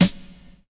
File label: Small Reverb Snare Drum Sample F# Key, steel snare drum sound tuned to the F# note